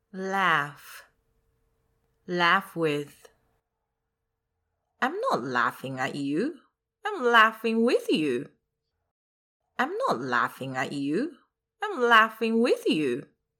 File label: laugh